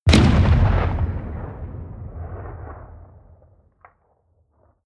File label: artillery medium distant